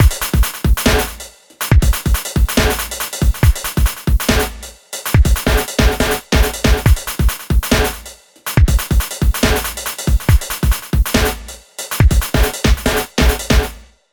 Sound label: dubstep